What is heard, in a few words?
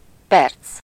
perc